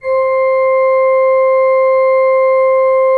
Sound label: C4, Cathedral Organ; ILIO; Propeller Islands